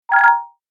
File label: Cartoon eye blink